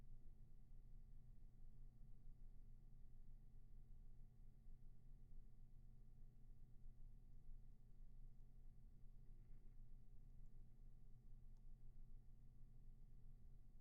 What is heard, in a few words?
ROOMTONE